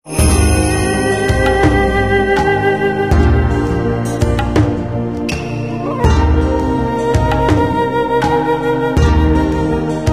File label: ending normal; Yotsume God Reunion